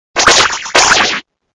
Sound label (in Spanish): Grito de Arrokuda; Gritos de Pokémon de la octava generación